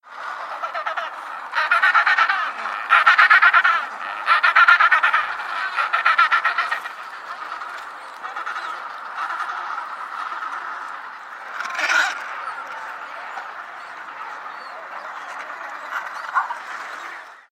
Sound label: penguin noise